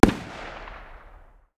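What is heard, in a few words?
light machine gun 762 medium distance; tacz-tweak-pack; taczmechanics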